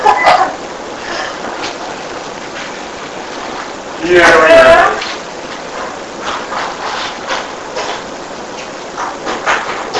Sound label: Unexplained 2 different moaning noises at the same time Amplified